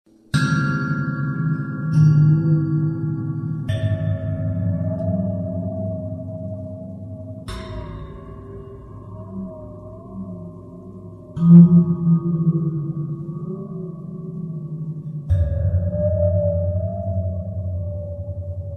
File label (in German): waterphone finger